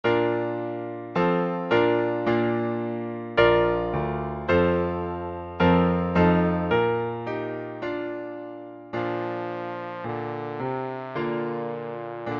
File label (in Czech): trombon klavir; Přednesová skladba pro trombón; Hymna - trombón